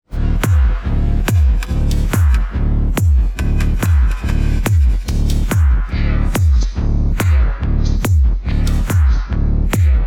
techno

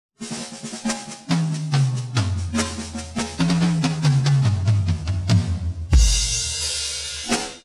drumsolo

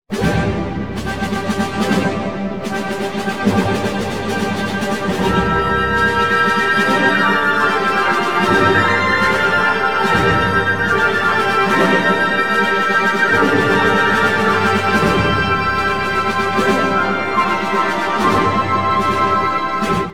classical

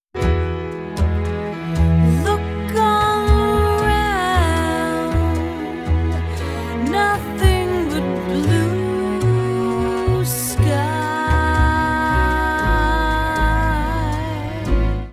jazz

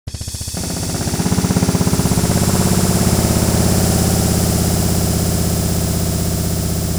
drummix

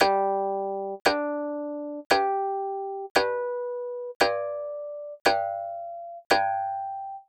Harmonics Synth, guqin

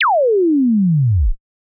impulse response

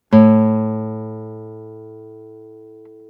GUITAR

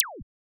impulse response

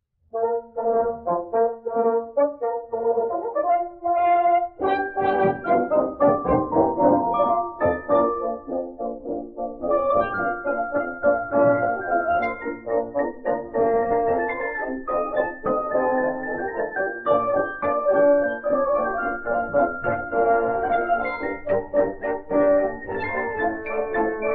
Boulanger Marsch denoised

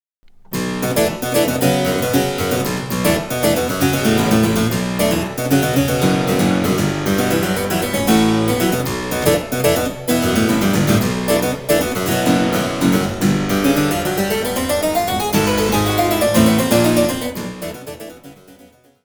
Cembalo Playing Funky

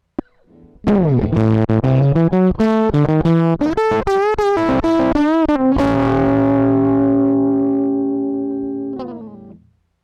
Tube Stage Crunch Blocking

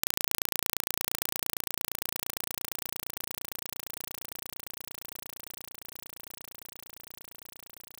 impulse response

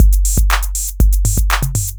drums dry

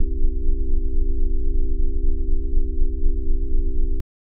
c major chord bass leslie slow, hammond

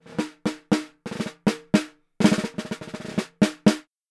snare

